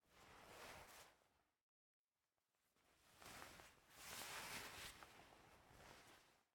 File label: Player Door Open Exit; Game rip; MiSide